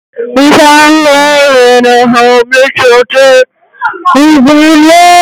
bass boosted

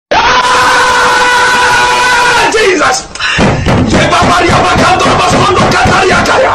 ahhhhhhhhhhhh jesus

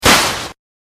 spells

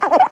gulp cartoon gulp; mgm; jerry

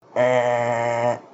wrong answer scuffed

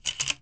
shotgun reload

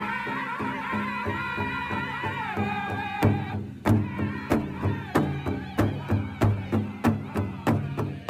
native american chant

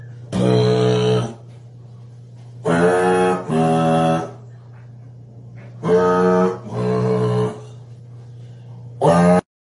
wubbox impression

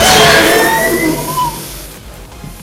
jumpscare; jolly 3

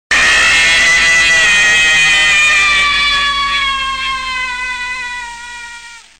jumpscare sound; five nights at ferrets 3

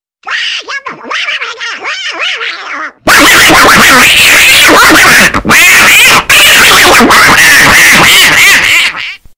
angry duck

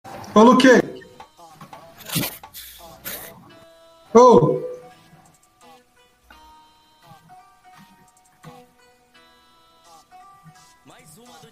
150bpm

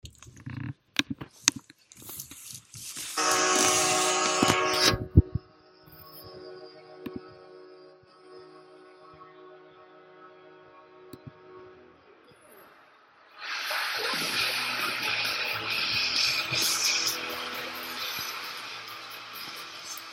goal horn; springfield thunderbirds 2023

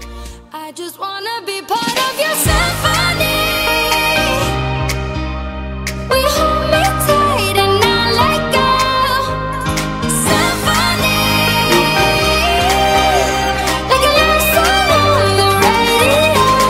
symphony song

